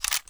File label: mp5 bolt back, weapons; garrysmod